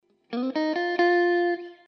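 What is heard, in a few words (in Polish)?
zagrywka po skali C-F, guitar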